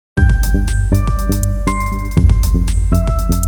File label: tv background intro